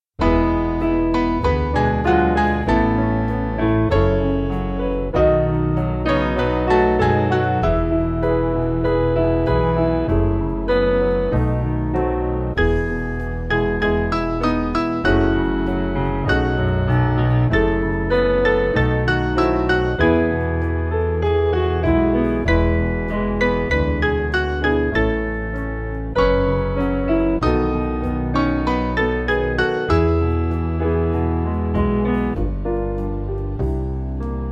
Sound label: Thank You God For Sending Piano, Free Music Backing Files for Churches